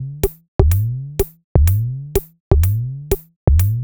Top B 125bpm, House Drumloops; 90 s Sample CDs; Zero-G House Fabrik Drumloops; ZG Drumloops

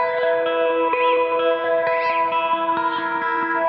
Gretsch Ring 130 E, guitar drone

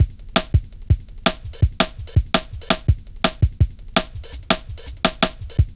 Rim Shott, Drum Loops 166.5; Zero-G Jungle Warfare 1, 2 & 3

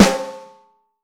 Vintage Snare Drum, 6.5X15; Q Up Arts; Johnny.C's Vintage Snare Drum; 6.5X15 LUD

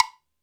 Hi Wood, Alesis D4 drum machine

